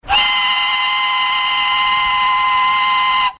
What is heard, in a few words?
train whistle